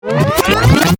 Tape Wind Ascend, Tape, Wind, Ascend, Hit, Delay, Accent, Transition; Production Element, Imaging Element; Digital; BlastWave